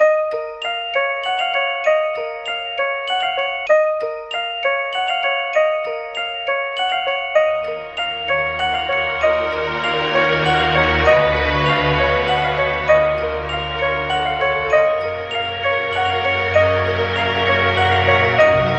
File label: keys and string and voice, sad loops